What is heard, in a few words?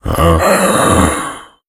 become alert, infected